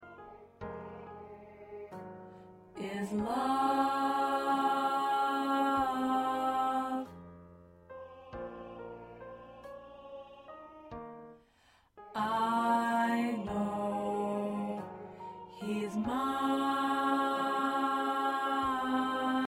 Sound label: Tenor